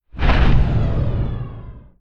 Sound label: Swipe